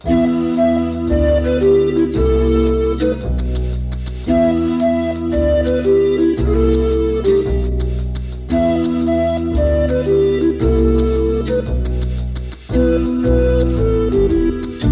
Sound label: night swing